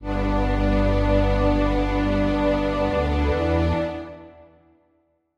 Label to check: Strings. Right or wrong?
Right